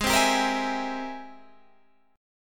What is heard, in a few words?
Ab Augmented Major 9th Ab Guitar Standard; strummed; Ab+M9; JGuitar